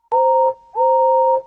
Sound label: whistle; Gordon